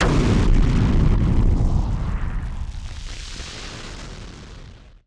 Party Popper FX

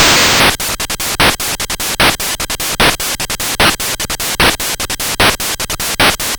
NES Combo Loop, Drums, 8-Bit; Sonic Foundry (Sony Creative Software)

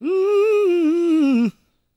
GOSP MALE, SOLO; Gospel

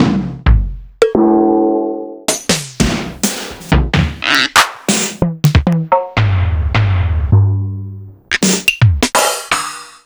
Fairlight IIx

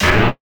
Percussive Hit, contact mic

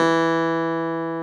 CLAV E 3; Roland - LA Composer vol. 1

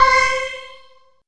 Synth Hit C

